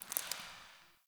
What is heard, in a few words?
Derelict Interior Water Drip